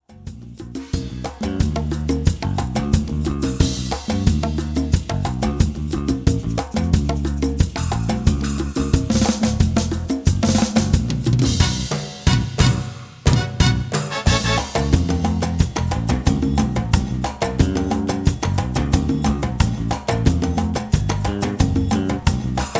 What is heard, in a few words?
Berimbau Metalizado